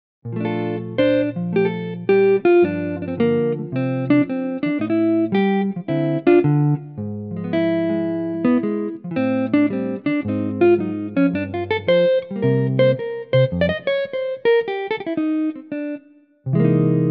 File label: F Electric Gtr